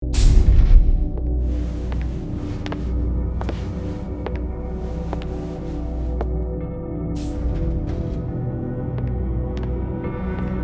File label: sfx music; dxni 505 confrontingsarif